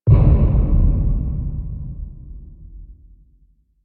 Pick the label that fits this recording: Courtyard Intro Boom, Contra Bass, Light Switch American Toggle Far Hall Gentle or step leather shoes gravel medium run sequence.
Courtyard Intro Boom